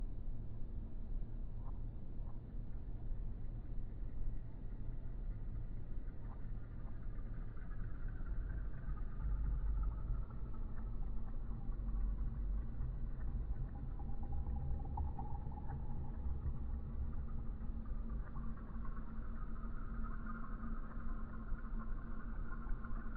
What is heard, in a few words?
Ambient Ambience Loop